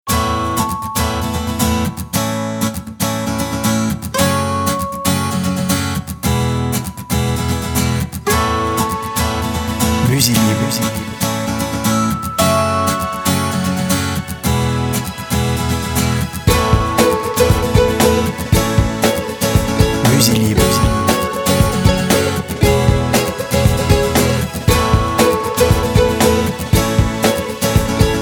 inspiring

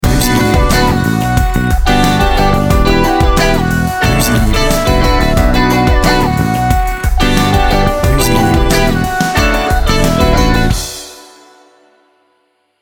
funk yeah jingle

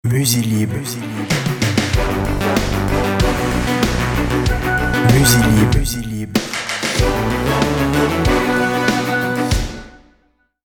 power jingle

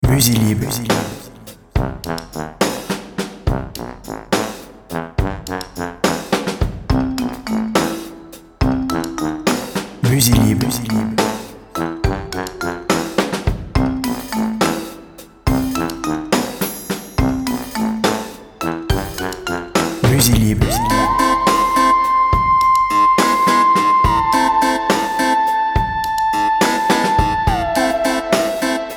funny halloween

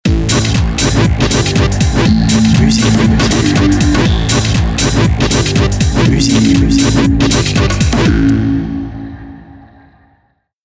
house, jingles

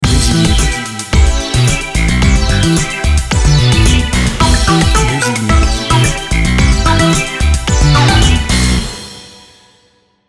french touch jingle

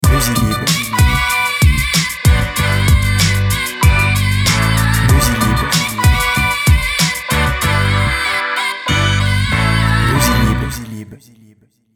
motibational hip hop

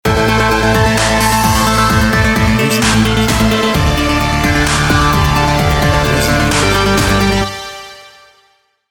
dubstep

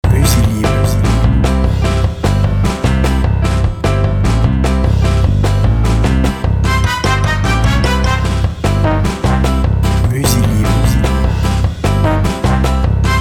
cartoon; Funny; TV/film scores; Children/Family; Happy; Youth/Party; Multimedia; Orchestral

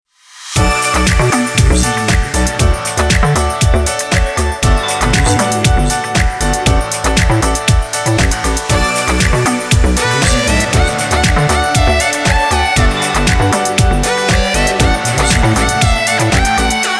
tropi call, jingles

